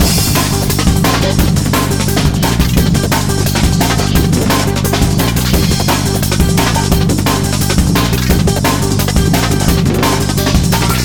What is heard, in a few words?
Combat; S.T.A.L.K.E.R. Clear Sky